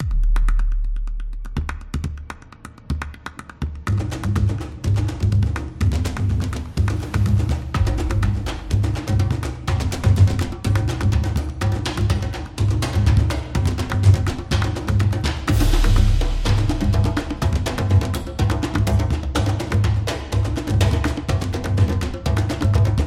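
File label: Ancient, battle background music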